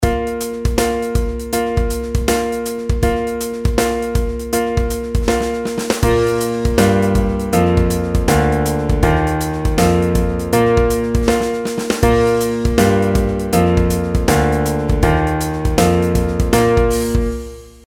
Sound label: pitch patterns skipwise